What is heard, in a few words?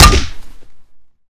Aluminum Hit, Bat; zps; Weapons; Melee